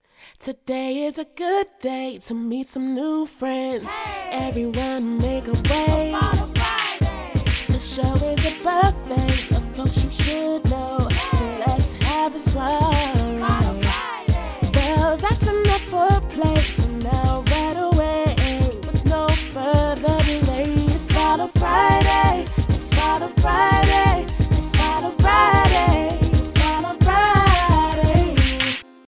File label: intro music